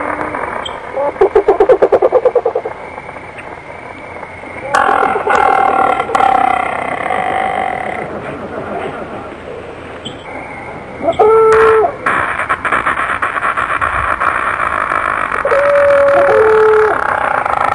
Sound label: tropical, zoo and nature